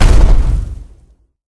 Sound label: slam, hammer